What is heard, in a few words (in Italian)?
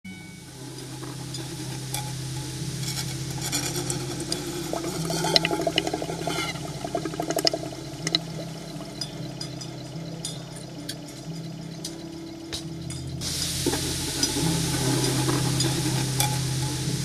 Musica da Cucina - Cuoce Piano